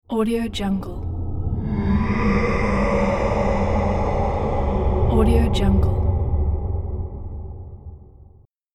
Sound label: atmospheric undead sound